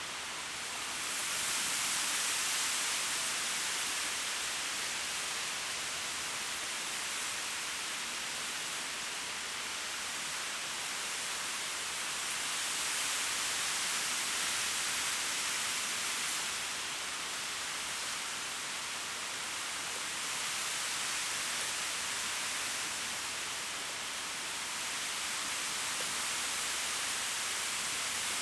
Wind in Reed Loop; UltimateFishing2020

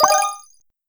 Task complete; UltimateFishing2020

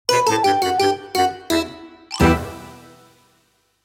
Scary Halloween Musical Transition; UltimateFishing2020

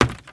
STEPS Wood Creaky Run; UltimateFishing2020

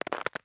Ice Footstep; UltimateFishing

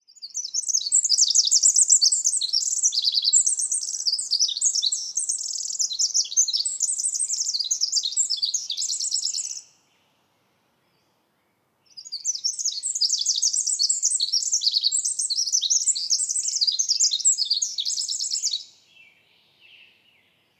Bird Wren; UltimateFishing2020

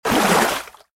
surfacing splash; UltimateFishing2020

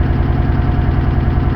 ENGINE Deep Slow; loop mono; UltimateFishing

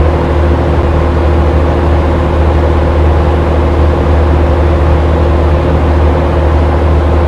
Fishing Boat; Ultimate Fishing Simulator 3 Demo

